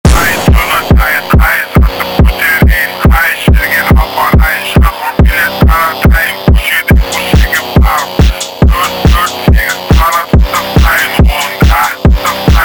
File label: Phonk